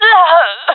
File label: death, fem; player vo jungle fem